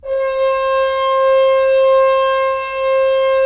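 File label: Solo French Horn, brass; Roland - LA Composer vol. 4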